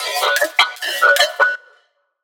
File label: Synth